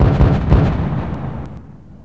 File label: tank; PC Gamer IT CD 32